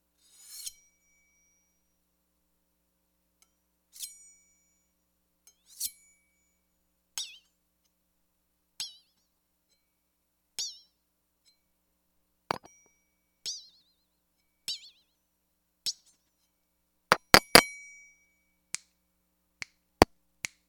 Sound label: Unseathing Wobble